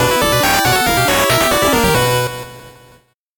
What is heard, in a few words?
8bit jingle normal